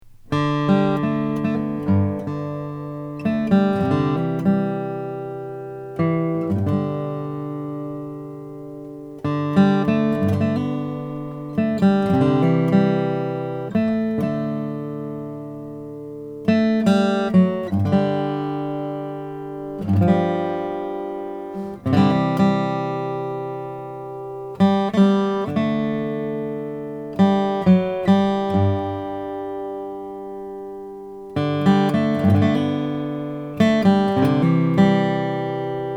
lowden ancient bog; winter 2017 ltd ed

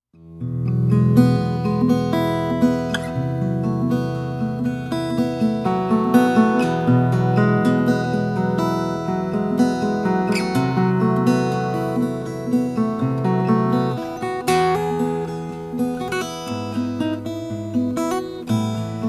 recording king rp1 326 mahogany

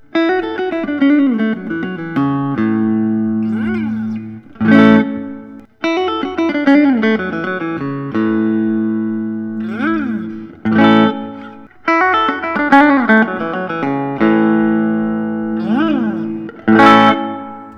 Forshage Orion Ergo custom; natural

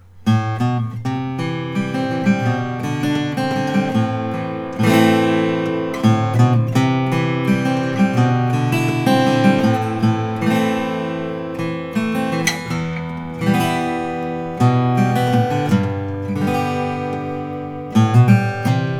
Froggy Bottom L deluxe koa natural

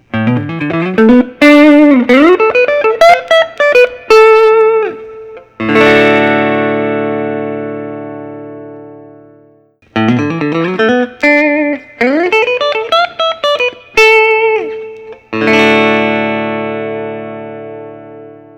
fano sp6 roundup orange

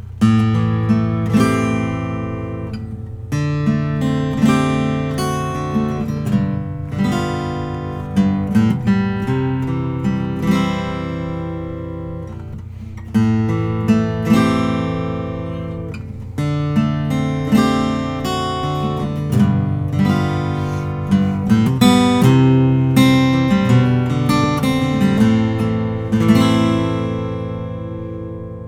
martin custom 00 42 12 fret; a natural

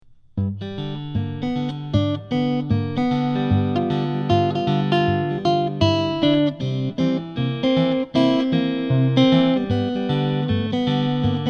pete turner marrakech bubinga; gold

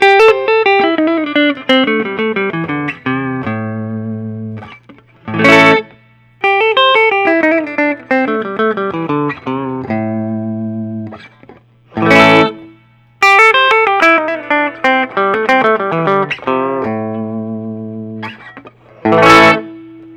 gibson historic 58 les paul

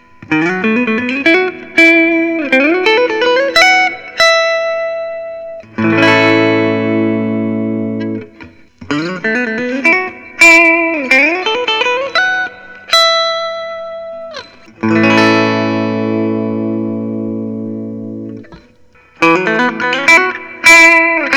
Gibson Custom SG Standard; faded cherry

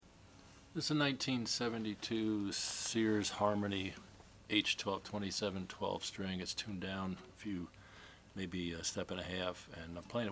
harmony silvertone s1227 natural brazilian

